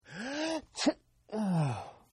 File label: Sneeze; Sneezing; Human; Partners In Rhyme